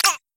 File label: monster unclick